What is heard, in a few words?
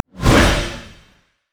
drunken rage; LoL; gragas